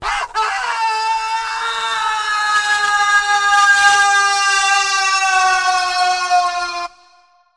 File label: scream long